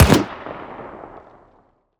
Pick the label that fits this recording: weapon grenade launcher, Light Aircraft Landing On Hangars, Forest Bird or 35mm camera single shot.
weapon grenade launcher